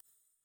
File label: Hover Magic